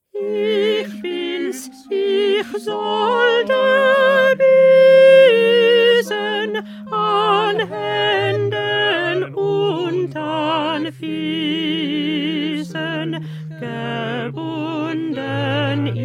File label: Alto; Bach; St Matthew Passion